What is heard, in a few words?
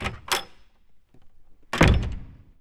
Door Heavy Open Close